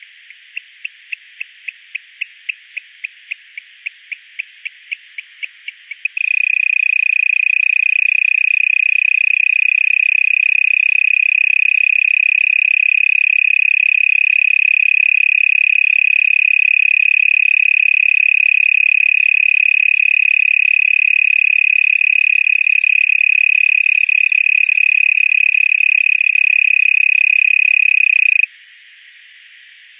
Advertisement call of Melanophryniscus pachyrhynus